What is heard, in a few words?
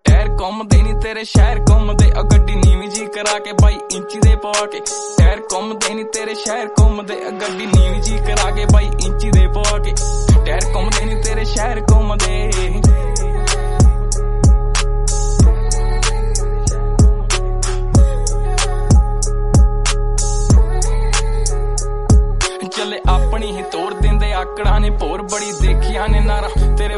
punjabi ringtone